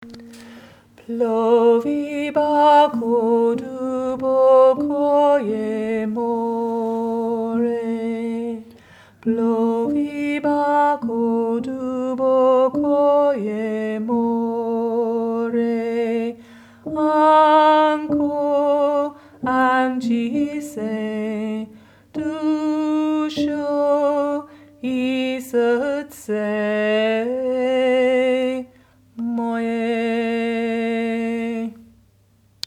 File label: Altos